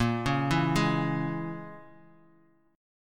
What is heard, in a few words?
Bb Minor 7th Flat 5th, Bass Standard; Bbm7b5 arpeggiated